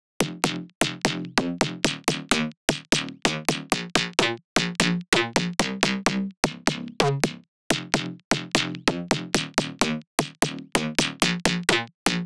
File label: 128 BPM F Min Bass; House; Drop Loops; Loop Stems; Cymatics; Sydney